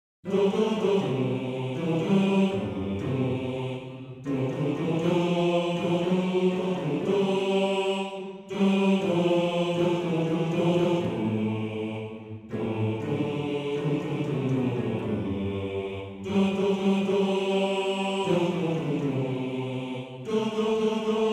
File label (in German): Bass 2